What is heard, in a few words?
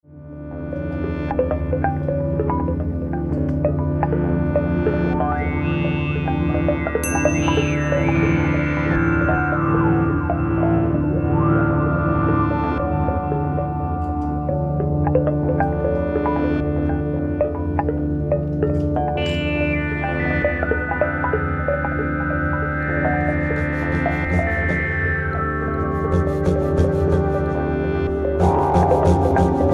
Theremin; atelier Theremin; Moehnesee